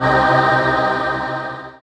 SYN DNCE, dance; Sound & Vision - Gigapack II

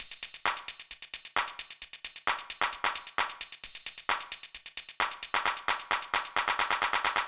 Beat, Old Skool House, 132bpm

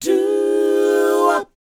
DOWOP A#, Vocal R&B DOO WOP; ILIO; Vocal Planet

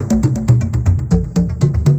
Beats, Synchro Funk; Poppin; Sonic Foundry (Sony Creative Software)

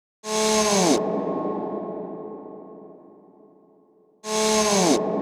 SFX, Trance NRG; Sonic Foundry (Sony Creative Software); Trance NRG VOL-2

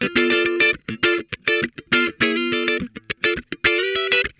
FUNKIN, Funk Guitar; 90 s Sample CDs; Zero G

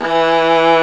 arco viola; E-MU Formula 4000 Series Vol. 5; Protozoa; Proteus2 Presets

